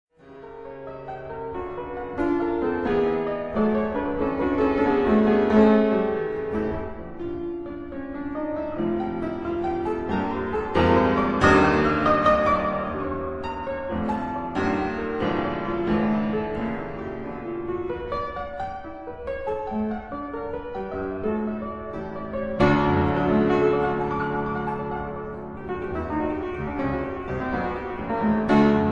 Rondo brillant for piano in B minor

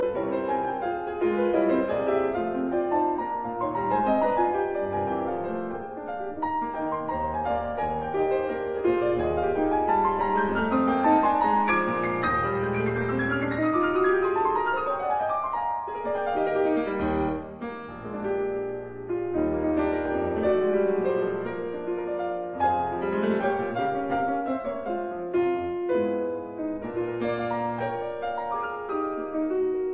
Rondo in E flat major; Chopin; Op 16

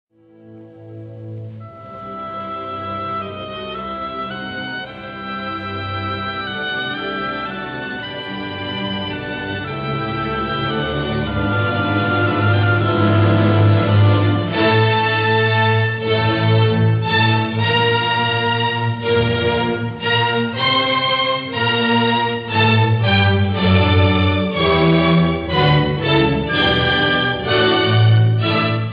Violin Concerto in D major Allegro non troppo; Brahms; Op. 77

